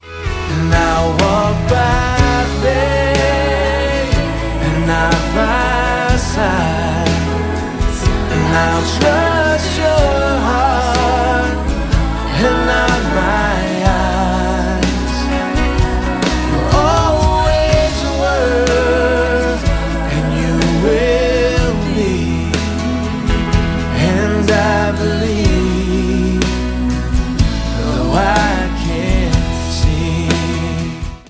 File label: chorus ringtone